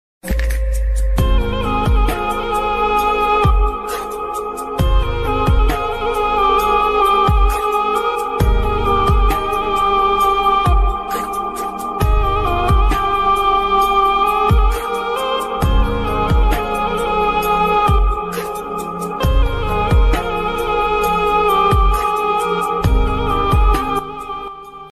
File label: very sad painful ringtone turkish arabic sad ringtone; very emotional music ringtone, arabic